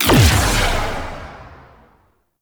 Crew ship Missile Swarm Fire; Warframe; RailJack; Corpus Crew Ship

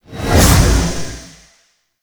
Guardian Shell Cast; Warframe; Focus; Vazarin

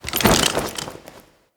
Idle Screen Foley Weapon; Kuva Lich

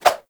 Spheres Throw Whoosh; Warframe; Weapons; Solstice Spheres

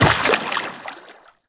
Step Water, Footsteps, Walk; Warframe; Characters; Tenno

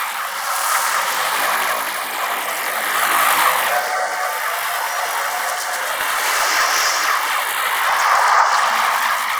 Mark Pillar Loop; Warframe; Revenant

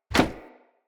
Kick Impact Wood; Envoy

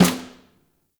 Stadium Snare Destroy; Warframe; Ambience; Nineteen Ninety Nine

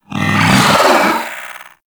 Pet Vocal Pain; Warframe; Alad V Pet

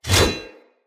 Whoosh, Swords; Weapons; Mockery; Mockery Turash

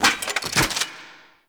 Crew Ship Pilot Finisher Land; Railjack; Grineer; Cinematics

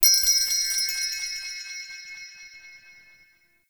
interior orbital station chime; Warframe; Tau

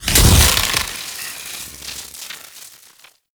Arachnoid Wraith Web Spawn; Warframe; Corpus; Abilities

